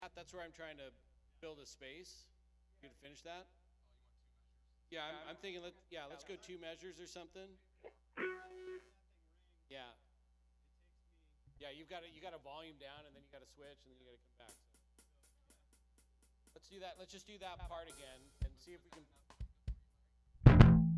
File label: Strings Mix